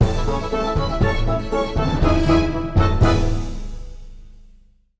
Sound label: News transition